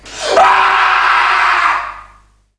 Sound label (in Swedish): skrik; ambience